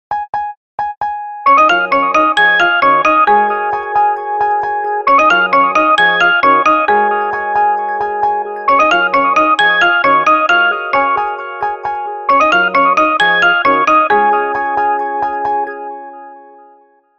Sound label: beautiful